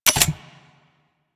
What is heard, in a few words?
Heavy Grenade Launcher Mech, Weapons; Warframe; Archwing